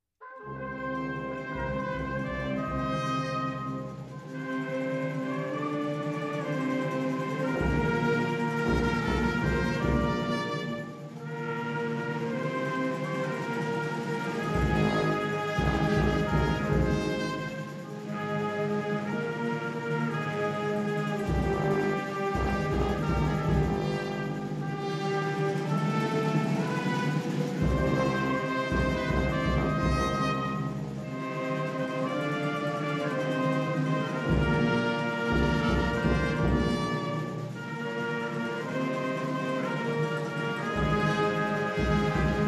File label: flamenco Trumpet (range)